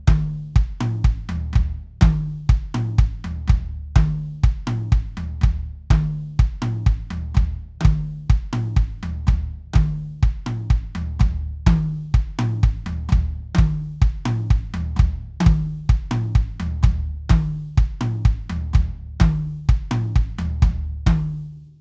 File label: roland drive intro pattern, drums